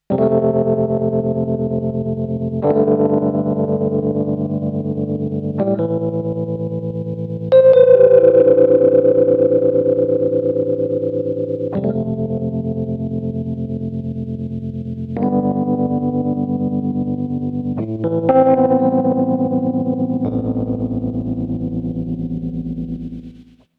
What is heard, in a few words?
Rhodes